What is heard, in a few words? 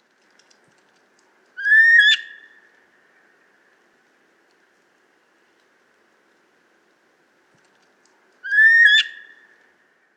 Siberian jay, پرنده جیجاق سیبری